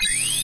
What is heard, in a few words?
predetonate, roller mine; hl2